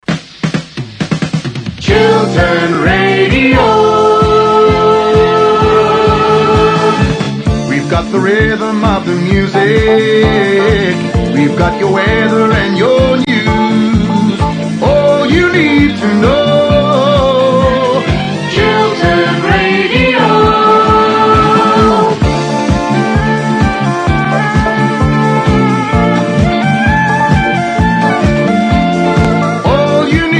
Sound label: Jingle; First Chiltern